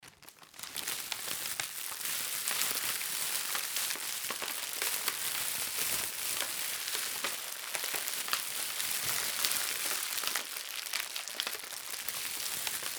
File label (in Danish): Ild